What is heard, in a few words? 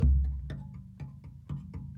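Calm Far, music; from dust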